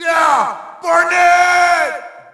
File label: Shout, audience; UFC Tapout 2